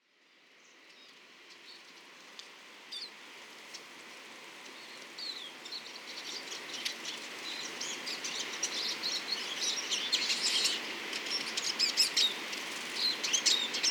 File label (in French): chant et cris de tarins des aulnes chant de grive draine